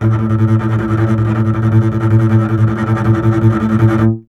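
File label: STR CBS, Solo Instruments, double bass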